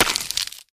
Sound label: fs Hit Light Ice Add; wild worlds